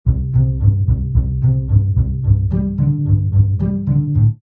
Orch Bss Pz C, Orchestral bass loop pizzicato C Major 110 bpm.